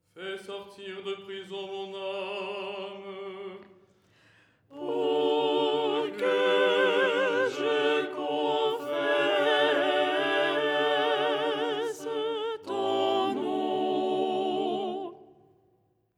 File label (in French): soprano; Verset : "fais sortir de prison mon âme..."; Les 8 tons en français